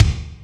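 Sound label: kick Thud Hall, Alesis D4; 300 Drum Machines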